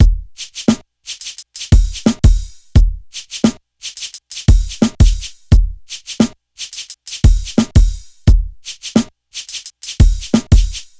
87 bpm drum loop sample e key, Free drum loop sample - kick tuned to the E note.